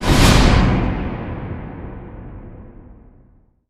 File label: threat defeated extended; transformers war for cybertron gamerip